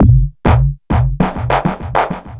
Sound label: Psychic Beat; Sonic Foundry (Sony Creative Software); Loop Noir; Session 08 - Psychic Investigator